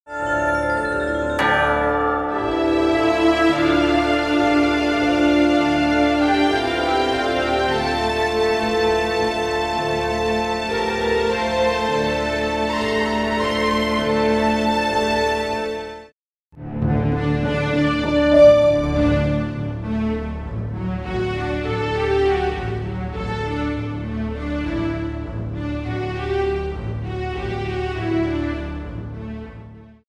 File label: Didgeridoo Conc 1st movt; orchestral music